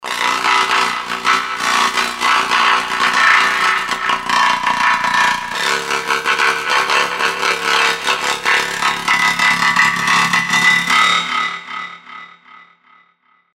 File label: strings studio digiridoo